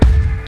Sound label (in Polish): przeciaganie klocka